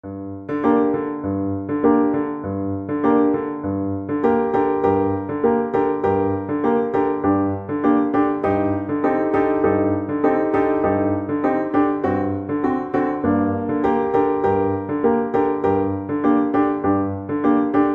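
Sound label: viola karaoke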